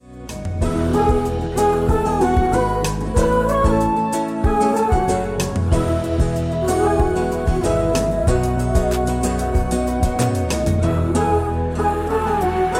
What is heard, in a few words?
Game over sound